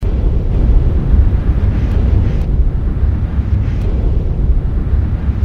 big hum; ambience

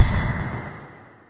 damage; q3rally

